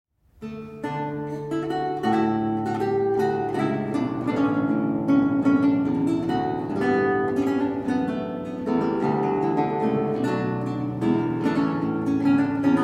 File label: Gigue, Works for Theorbo; Musique pour le Roy Soleil; Robert de Visee